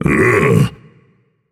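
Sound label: non-verbal ugh; smite; atlas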